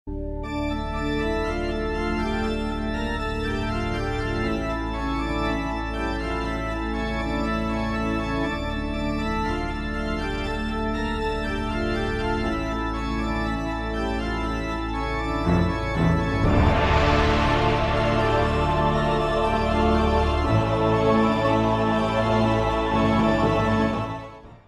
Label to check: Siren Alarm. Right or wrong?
Wrong